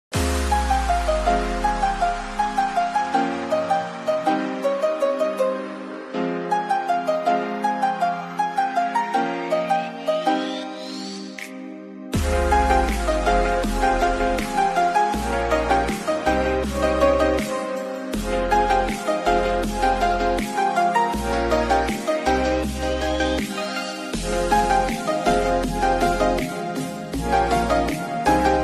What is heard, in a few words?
Mobile Ringtone